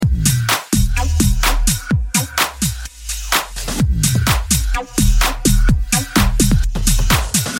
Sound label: emote electro shuffle music; fortnite emotes; athena